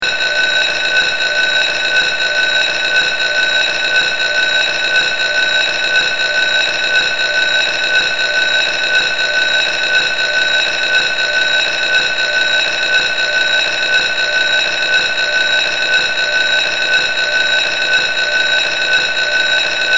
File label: Chime; 广播室铃声系统